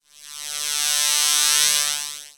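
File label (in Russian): комар звонкий близкий нудный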